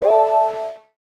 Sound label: Reached Quota; Lethal Company